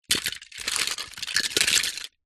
toy cars metal pile movement

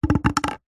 cardboard tube toilet roll drop wood floor

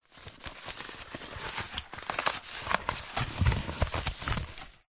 cardboard piece small handling movement crumple

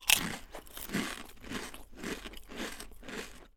human eating potato chips crisps

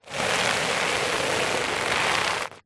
household hairbrush scrape bristles on carpet

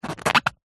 cartoon rubber squeak; zapsplat

